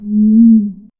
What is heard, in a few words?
fading mournful howl spir; dissipating